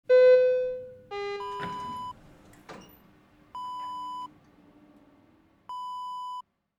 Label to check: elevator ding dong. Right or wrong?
Right